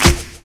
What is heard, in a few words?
ZULU CLAP; Univers Sons - Kitch 70s